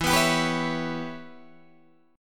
E Minor 6th guitar chord, Open G

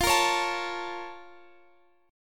Bb Minor Major 7th

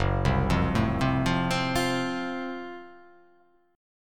F sharp Minor Major 7th Flat 5th F sharp down

